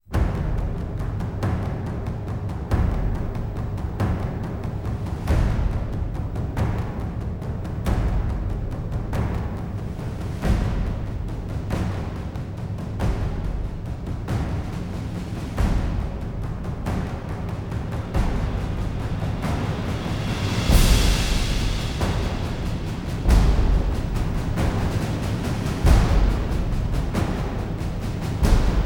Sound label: drums